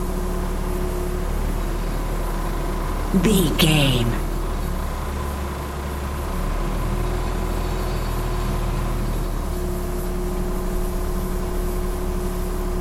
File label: background air compressor pump loop